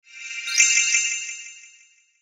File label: Pixie Dust Swell Tinkle; Magic